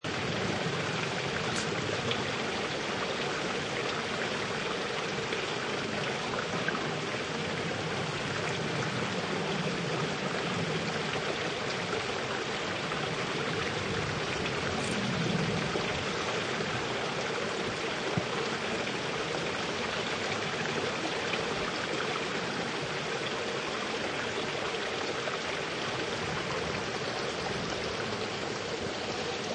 Medium River 30 Ft Distance, A medium to fast flowing river; A medium to fast flowing river, recorded from 10 m (30 ft) distance for a smooth, subtle river a; Forest; Lynne Music